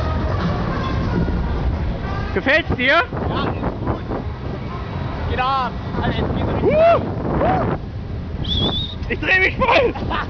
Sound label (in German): Kettenkarussel